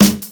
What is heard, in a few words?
present acoustic snare sound g key; snare drum samples